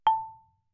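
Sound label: Marimba cord fortissimo A5; Percussion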